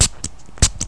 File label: SPRAY